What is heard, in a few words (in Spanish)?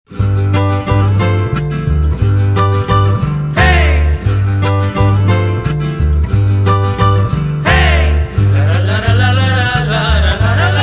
Guitarras, Mariachi